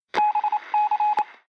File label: hideout room ready main Building